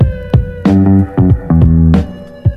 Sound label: full, Loops Phonky